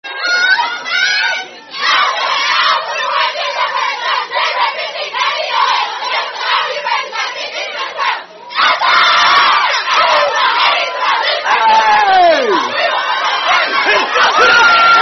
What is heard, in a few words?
Unknown Sorority Chant